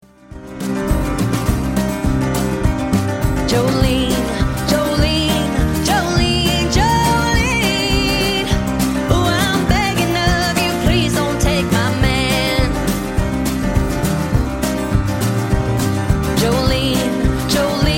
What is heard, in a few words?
ringtone